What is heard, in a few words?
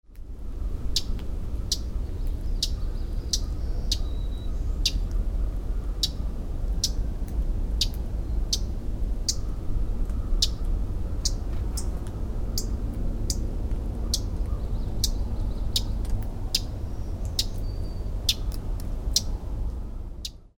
Common Yellowthroat, Maine; Great Wass Maine